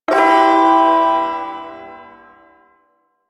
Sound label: Shawzin Maj Chord C; Warframe; Duviri Ersatz Shawzin